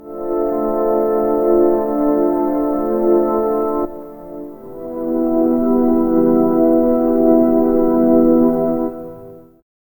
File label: soft synth organ